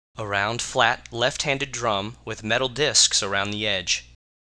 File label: tambourine